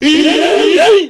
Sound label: divebomb fail